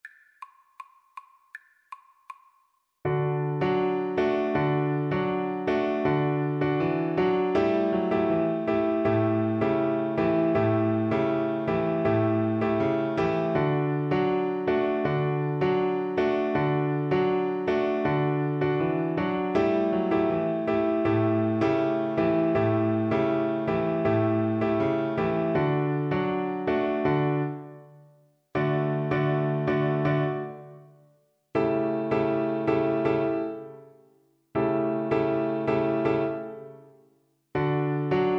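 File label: viola karaoke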